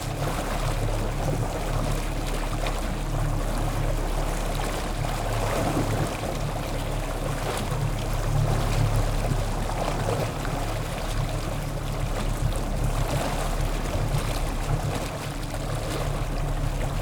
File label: boat Arvor 215 exterior engine diesel on low rpms propeller stereo; Soundholder